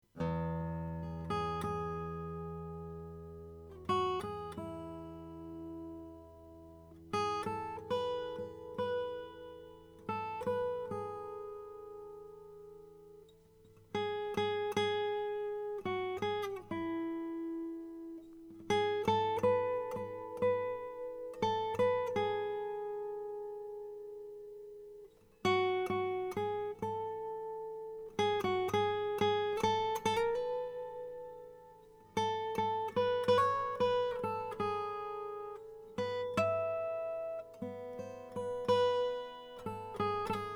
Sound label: sol steel string; rodrigo moreira